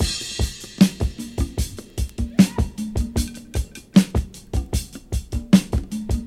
76 bpm fresh breakbeat D key, Free drum groove - kick tuned to the D note. Loudest frequency: 805Hz